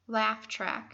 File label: laugh track